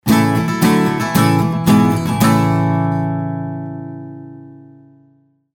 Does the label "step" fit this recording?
No